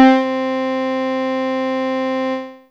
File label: Clav C4, Fairlight CMI Analog